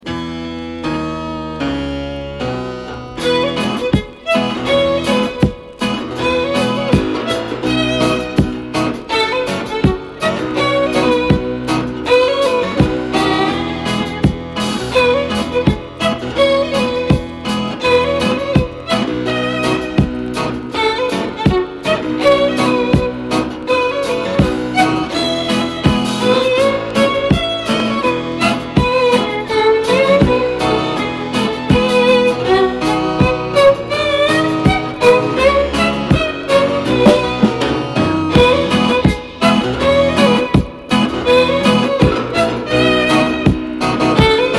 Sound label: red nosed reggae